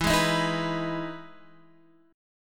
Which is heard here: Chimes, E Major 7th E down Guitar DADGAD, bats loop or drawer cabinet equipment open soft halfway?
E Major 7th E down Guitar DADGAD